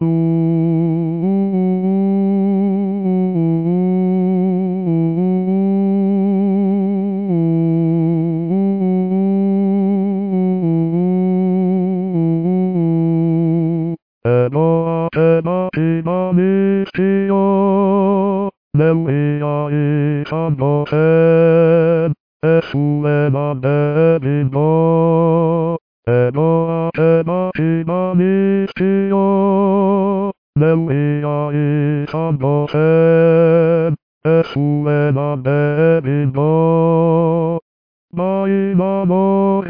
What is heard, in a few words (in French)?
Basse